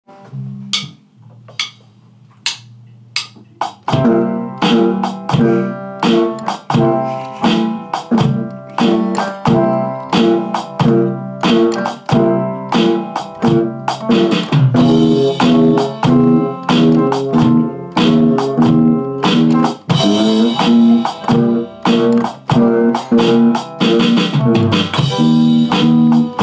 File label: 12 bar blue practice piece